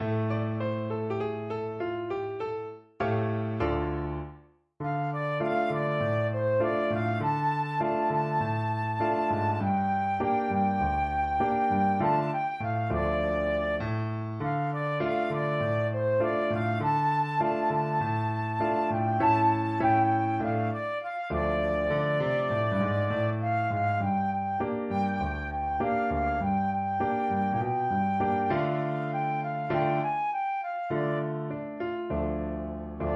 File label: Didnt My Lord flute